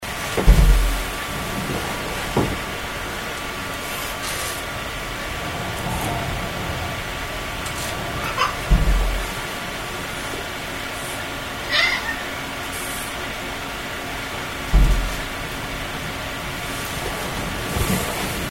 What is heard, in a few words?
maybe a cat; fieldrecs